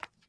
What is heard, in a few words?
fs marble, footsteps